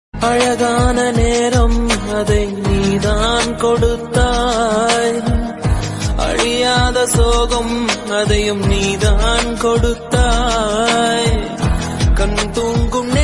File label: Tamil Movie Song; ringtones; Deepavali